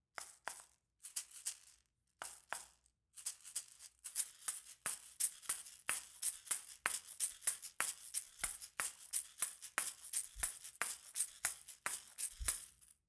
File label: double ball rattle Shaker special Rattle Shaker Percussion Instruments; Afroton